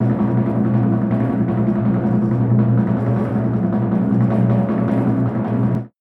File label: Drumroll; Kernel; Skylanders; SuperChargers